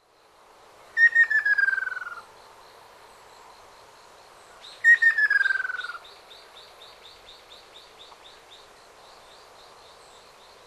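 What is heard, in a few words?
Ryukyu Ruddy Kingfisher; okinawa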